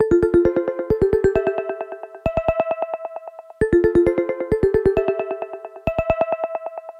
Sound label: standartnaja melodija android umbriel